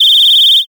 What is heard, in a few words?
whistle long